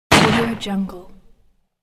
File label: glock pistol gun shot; wistansound